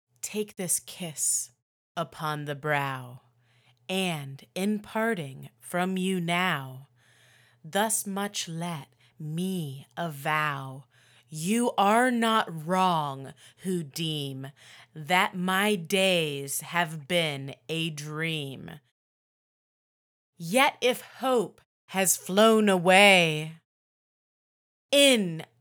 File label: Vocals